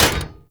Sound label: Short Sword Medium Impact Dmg Metal; Weapon Impacts; Player; Swords; Combat